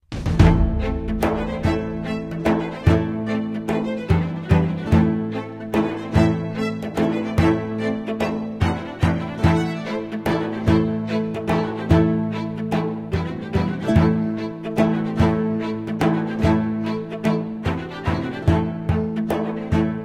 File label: Chest Music; runic rampage